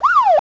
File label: Police Siren